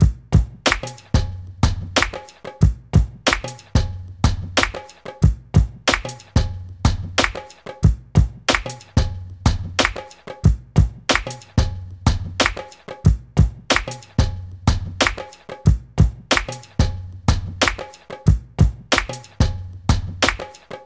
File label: Hip Hop Drum Loop